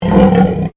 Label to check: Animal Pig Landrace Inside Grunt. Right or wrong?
Right